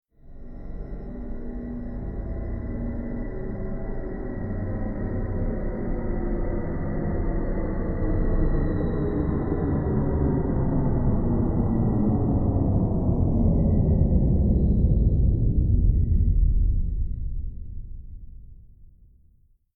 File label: Spacecraft Landing SciFi Engine Winding Down; Ascending Tone; Spacecrafts